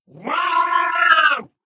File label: ballbot jump short